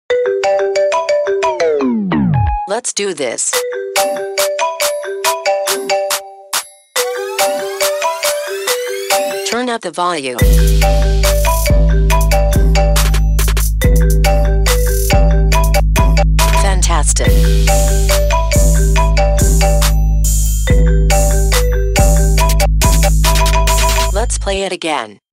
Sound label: siri ringtone